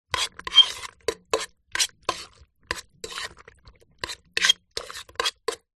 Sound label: pasta cooked in metal pan movements with metal spoon; zapsplat